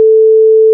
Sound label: tone 440; tone.mid.440